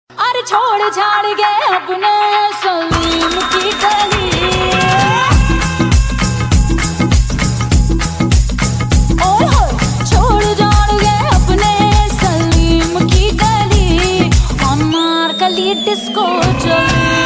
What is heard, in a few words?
disco sound ringtone